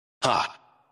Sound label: laughing shockwave